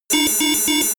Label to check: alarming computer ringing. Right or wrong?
Right